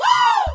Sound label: woo female; ooh ahh; ho hoo woo